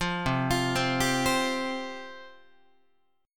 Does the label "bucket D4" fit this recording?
No